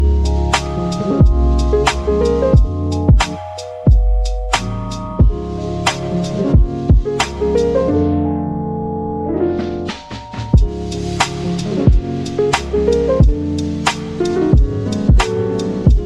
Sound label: metal resolve